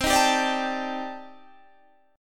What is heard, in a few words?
C Minor 6th Add 9th C Guitar Standard; Cm6add9 strummed; JGuitar